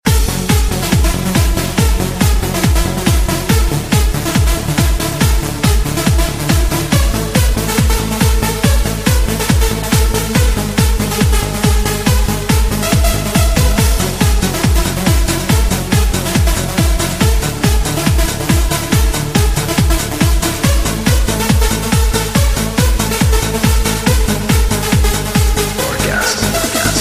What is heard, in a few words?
Trance Ringtone